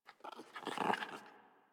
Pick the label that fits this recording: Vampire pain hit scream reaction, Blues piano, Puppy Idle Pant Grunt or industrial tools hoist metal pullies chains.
Puppy Idle Pant Grunt